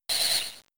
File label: Sonic Boom; Pokemon; Gen 3; attack moves; RSE